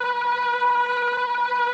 ORCHESTRON B2; Sonic Reality; Vintage Timetraveler

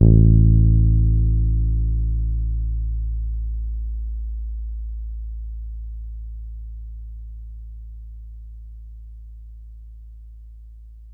Es A2 hard, Bass, Pedulla Thunderbass; Giga Samples Collection

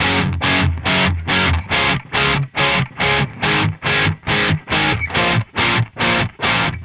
Distorted Guitar 140BPM C#maj; Distorted Guitars; Vengeance - Essential Clubsounds Vol.4; Guitarloops

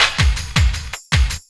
LOOP, 160 BPM, Drum & Bass; Ueberschall; Drum & Bass Flash Trackz

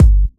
Kick, Kickdrum; Equipped Music; Loungin House

